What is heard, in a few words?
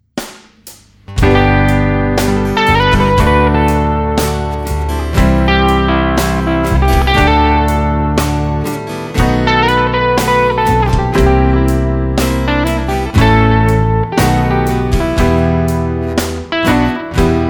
instrumental